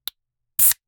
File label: pickup a soda can